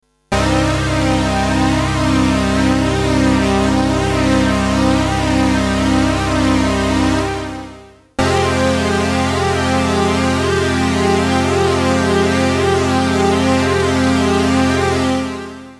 Boss CE-300

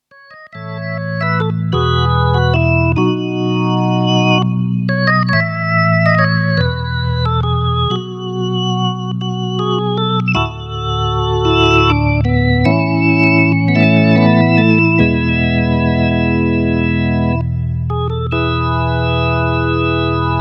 Hammond XK 4 Vibrato V1 V2 V3

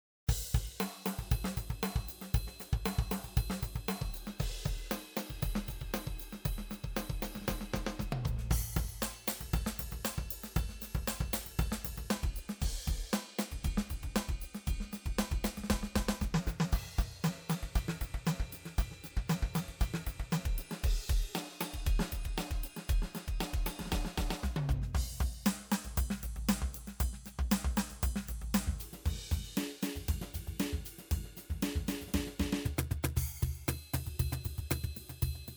RC-600 Drum Kits

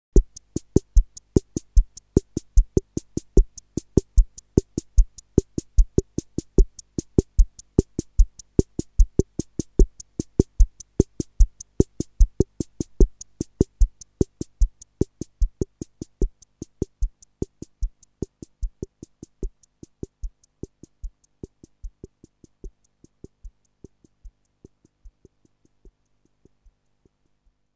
rhythm80 bossa nova

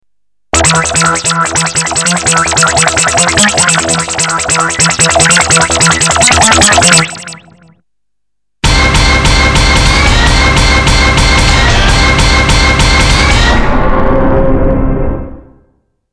techno hits

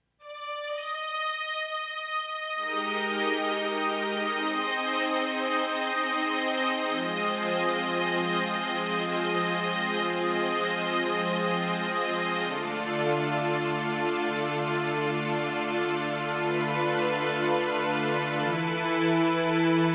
Strings

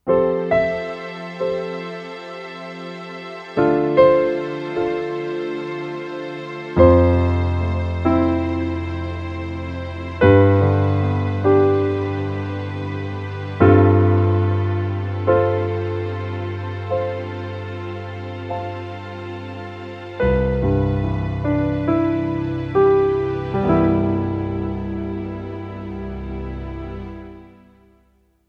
Casio PX-S3100 Piano Strings Layer

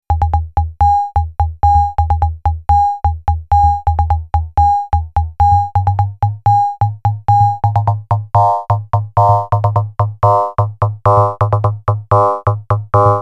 twincussion dyna crossmod

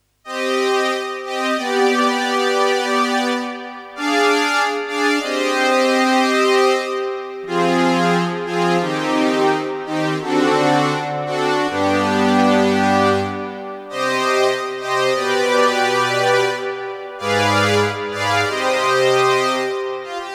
Viola SS 30

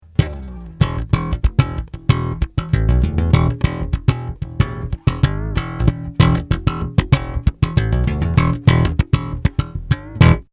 slap

